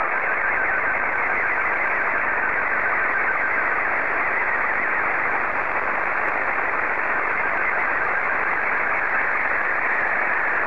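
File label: SSTV, Scottie 1; VK4MSL SSTV RX @ QG62ln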